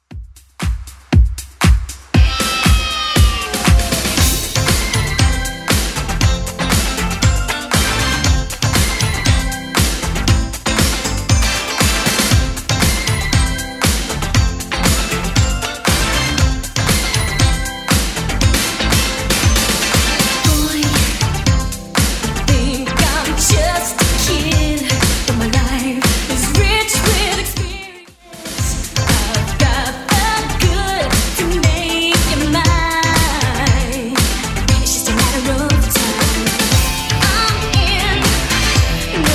80s Club Edit 118bpm

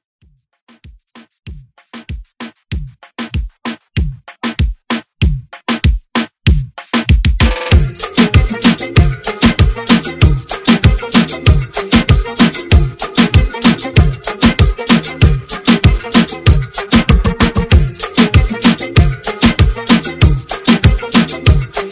Reggaeton Redrum

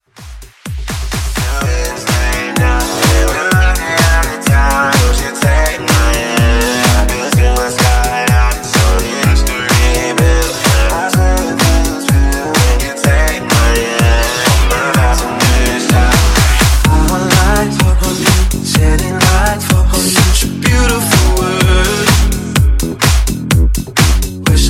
Dance Redrum, 126

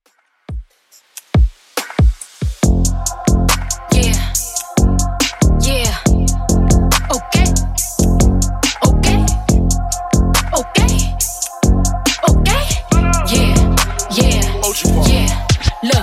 Top40 Redrum 70bpm DIRTY

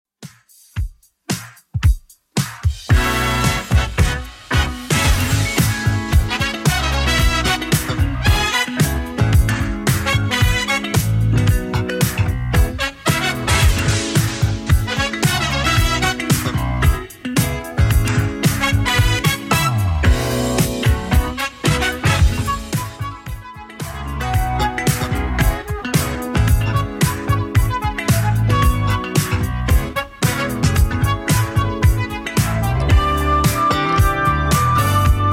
80s 112 bpm

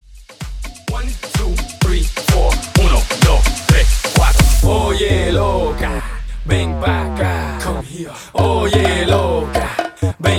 128 BPM; Mashup; ReDrum

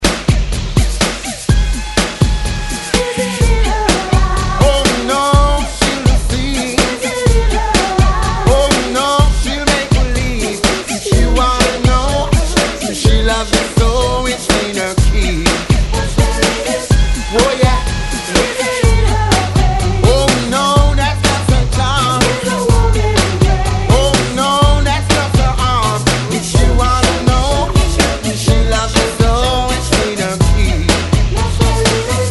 124 Bpm; DJDOLLS; 90 ReDrum Remixx

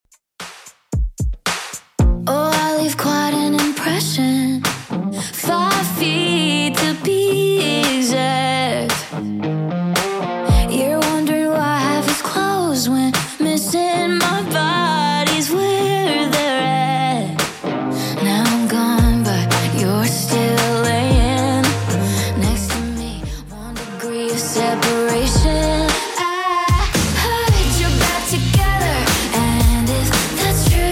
Top40 Redrum 113 bpm CLEAN

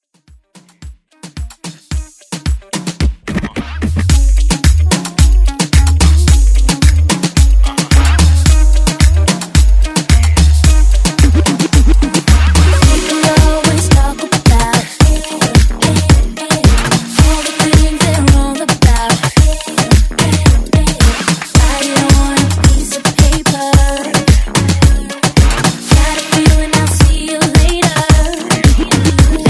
Moombah Reboot

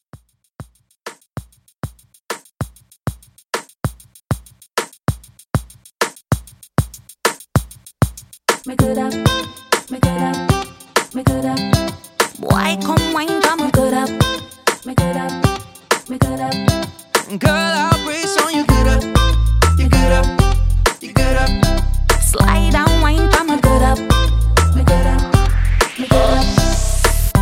Dancehall Re Drum 97 CLEAN

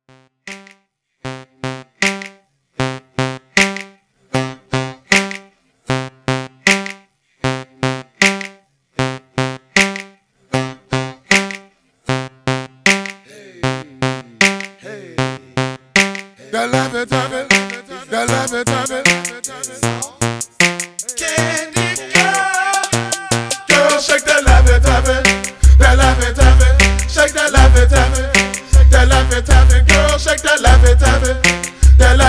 Extended 77Bpm

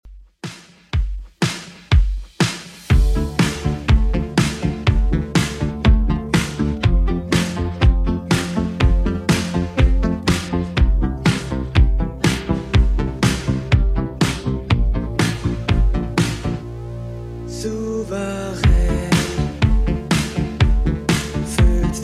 80s 122 bpm; Redrum

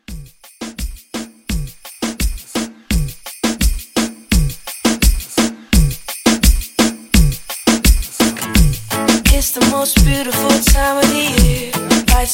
Christmas Reggaeton Re Drum 85 CLEAN

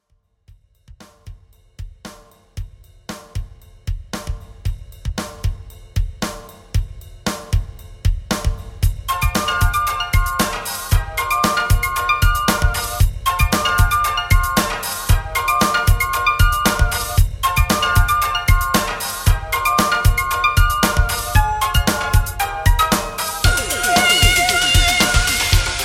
80s Dance Redrum 116Bpm

